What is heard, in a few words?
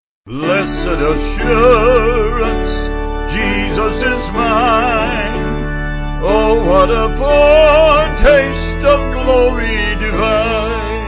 Vocals Piano Organ